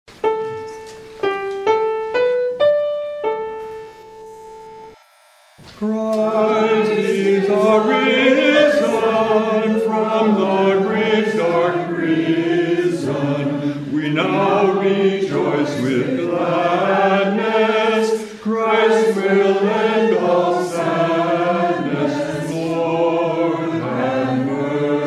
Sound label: hymn; Christ is arisen